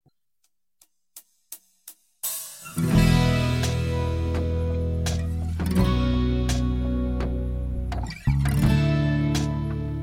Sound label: Rhythm